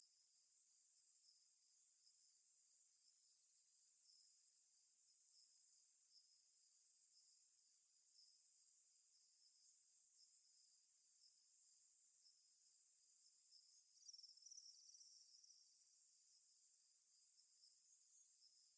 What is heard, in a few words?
cricket highpass 7k